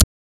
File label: click